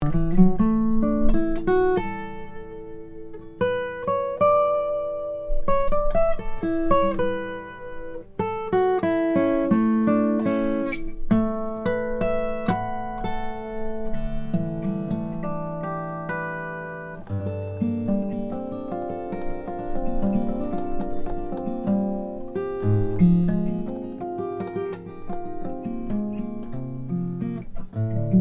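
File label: huss dalton brazilian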